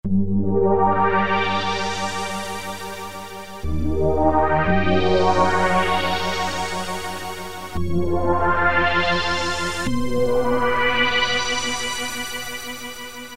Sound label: Sweep I, Roland Juno-106; Factory Preset